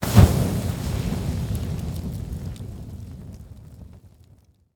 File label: Arrow Launch Fire; Timelock VR